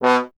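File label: bass trombone B; Miroslav Vitous; Solo Instruments VOL-1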